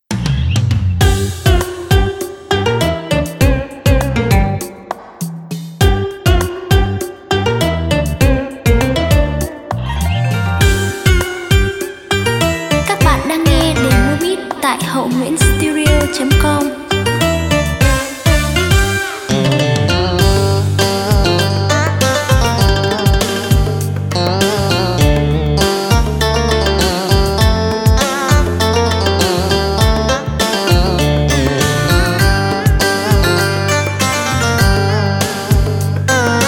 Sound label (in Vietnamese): beat